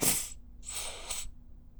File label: Plop - Wheeze, bottle plops